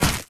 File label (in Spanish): impacto; archery target practice